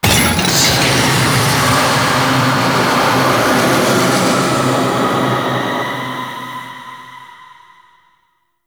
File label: Crate Destroy; Warframe; Entrati; Vaults